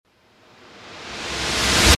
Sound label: Reverse; RADIO STAR